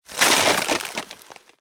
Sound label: crunch, volcanomining